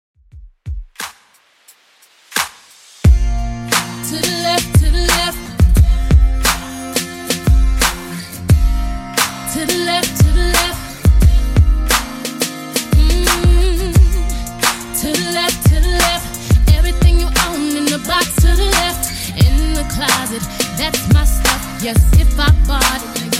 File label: 2ks Redrum 88bpm CLEAN